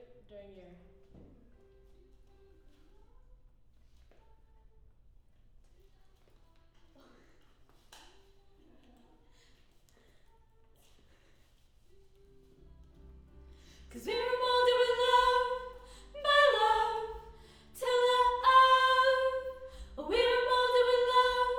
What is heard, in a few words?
Choir Harm High Mid